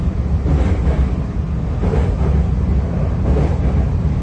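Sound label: ambience Train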